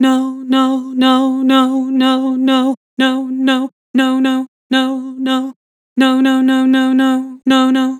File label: Vibrato "No", Harmor Vocals